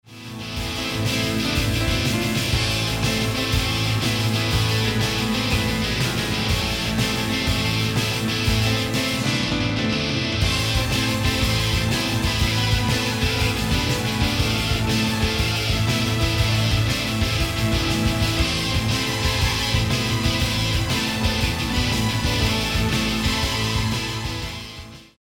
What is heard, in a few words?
synth